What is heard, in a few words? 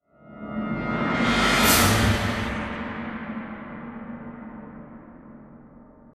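STINGER CYBERTRONS FATE CLUSTER SWELL, soundtrack; transformers war for cybertron gamerip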